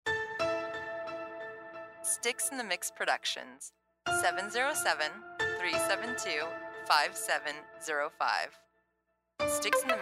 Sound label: Ominous